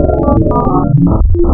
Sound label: KORG VS, wavetables, Classic Synths